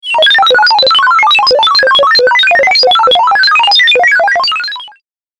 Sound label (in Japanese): ピコピコ メカ ノイズ 長